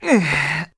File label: Poisoned; Link poisoned (drinking Purple ChuChus)